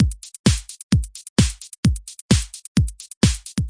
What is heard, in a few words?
130 bpm tinny electronic, drum loops and breaks